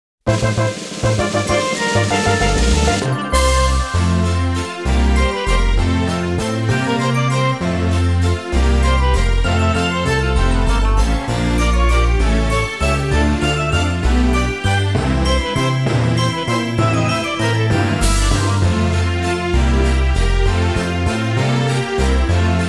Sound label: Water Waltz; TwinBee Rainbow Bell Adventure